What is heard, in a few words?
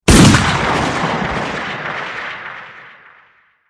gun fire sms ringtone